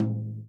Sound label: tom, Real Drum Kit, jungle kit